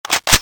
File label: spas12 bolt pull; spas12excraft; weapons